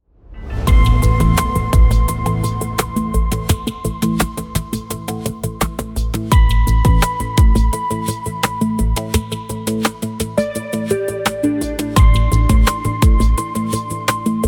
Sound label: Dark Connections active, percussion